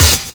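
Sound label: MUTE CRASH; Univers Sons - Kitch 70s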